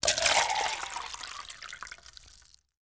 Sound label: Liquid Pour into Cup